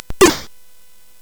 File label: Tecnetium, CSG8580R5; hvsc